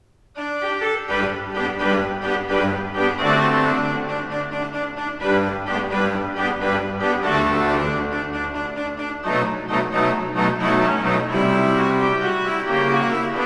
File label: Fanfare; Versets du Magnificat du 6e ton